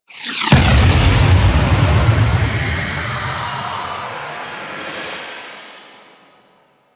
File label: Oro Void Portal Powered Up; Warframe; Ambience; Orokin; Oro Sabotage Objective